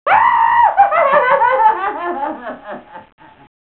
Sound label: witch laf